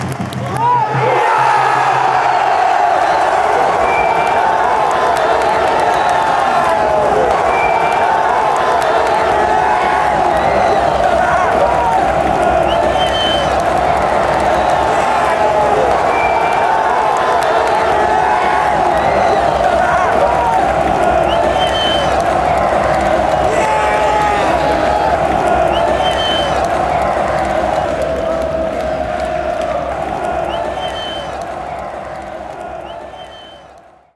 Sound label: goal standard, fanchants